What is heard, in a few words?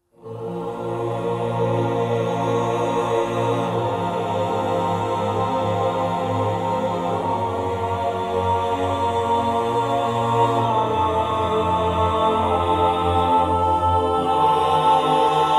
The choir